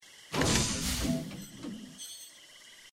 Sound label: coin open pickup; risk of rain 2